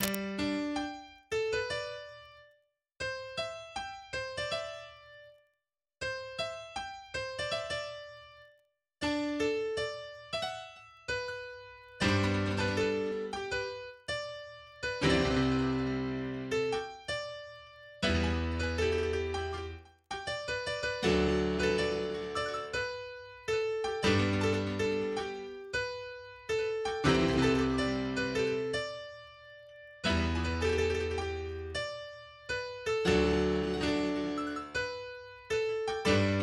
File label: Country Piano